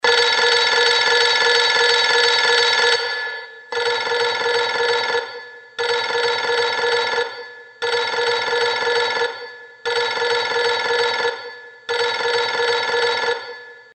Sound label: bell